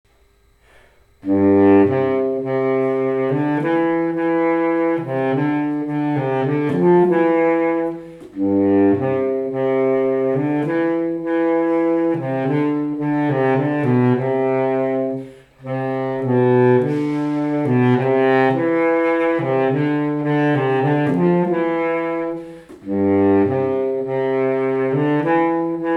Bari Sax Austrian Melody; 8th grade all state

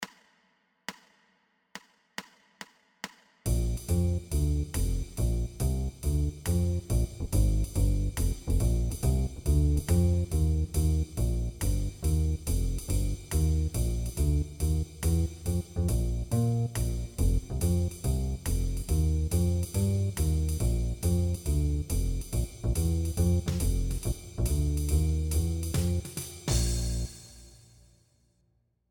Blues Bb Piano; 8th grade all state

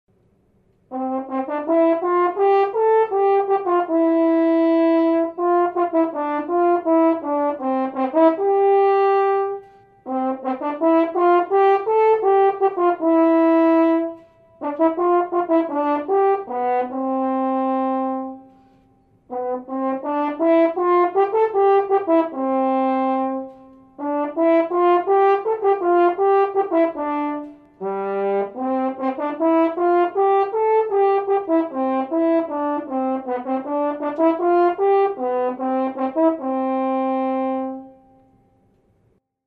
Horn; All-State; 8th grade

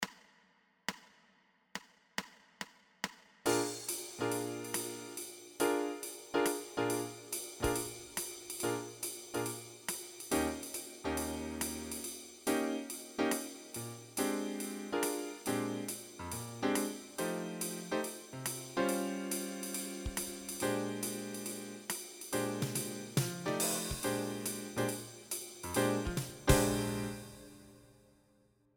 Blues Bb Bass; 8th grade all state

